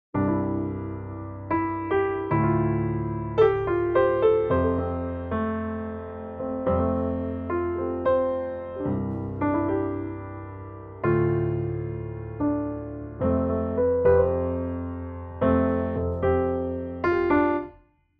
session keyboarder studio piano frei gentle ballad; logic pro 11